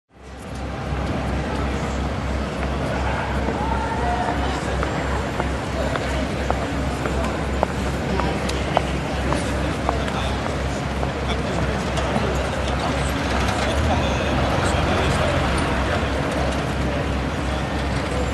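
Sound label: sounds of city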